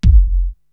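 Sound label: BD Squash, bass drum, drum machine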